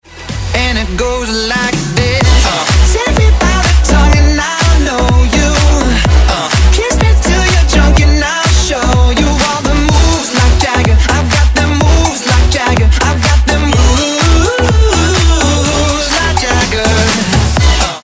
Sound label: BEAT